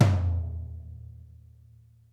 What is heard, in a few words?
Interactive Drum Kits R; Sonic Reality; 90s sample cds